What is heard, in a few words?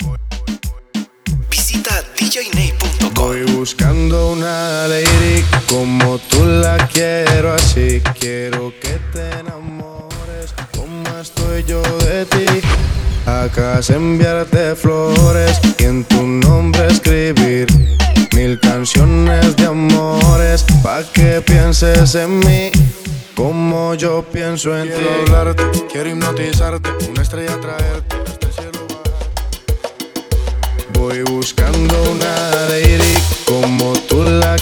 Acapella